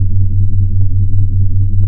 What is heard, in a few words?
spell cast; Adventures in Lestoria; action RPG game; Sig Productions